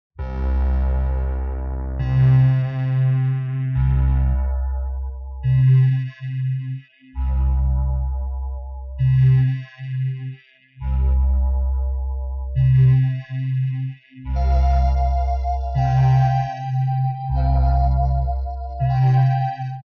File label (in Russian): proigrysh zvuki